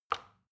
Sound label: plastic button tiny release heavy